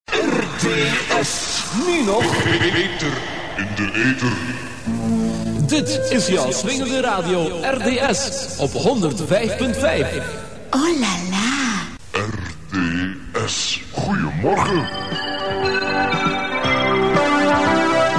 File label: jingles; RDS Roosdaal